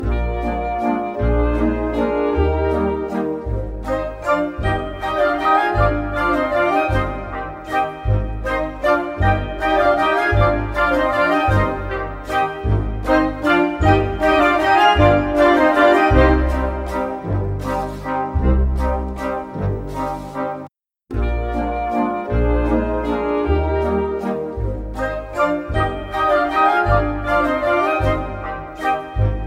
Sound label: waltz no 2 part D